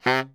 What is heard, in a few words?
BARI FF C 2, Saxophones; Greytsounds - Philip Wolfe David Crozier's Saxophones; BARI SHORTS